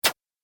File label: Droid Click, Robot Sound Effects